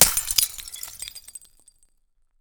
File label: ice